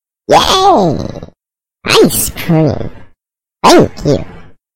ice cream alert

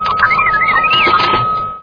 activate sound; lego star wars

